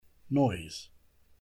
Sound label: noise; fun with sounds